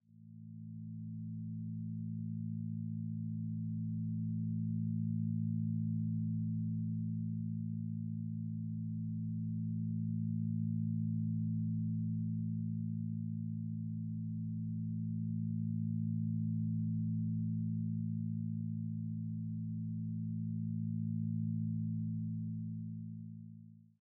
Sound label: music ambient